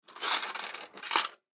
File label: Coins Grab Pile From Drawer; Money; Foley